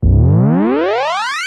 powering up, zaps and beeps

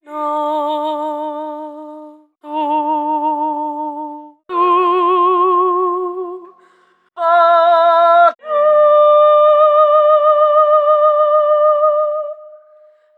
voice chants, Loops, Sad

